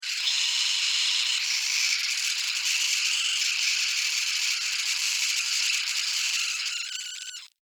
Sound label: Insect Voice Pain, Insect, Voice, Pain, Vocalization, Bug; Sound Effects:Animals:Insects; Blastwave